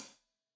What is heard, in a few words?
Hat Loose, Hi-Hat, Cymbals Stereo